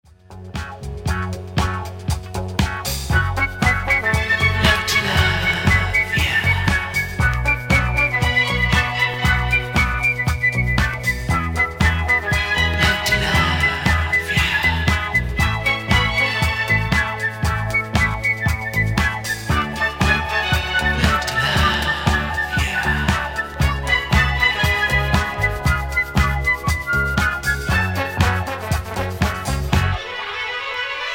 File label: CLASSICAL SALSA